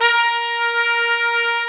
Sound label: TRUMP; AKAI S-Series CD-ROM Sound Library VOL-2; TP+SAX1